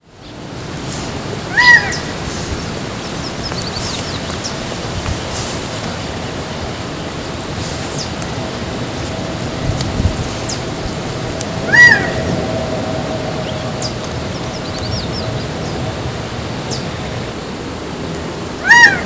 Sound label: Callipepla gambelii Gamble´s Quail; Wetlands Park; L Vegas USA